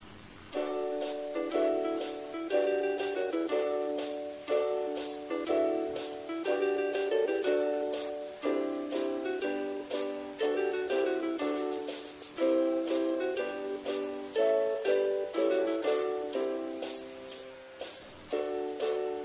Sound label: taiko